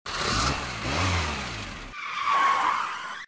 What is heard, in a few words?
BUS accelerating and swerve screech; dyslexia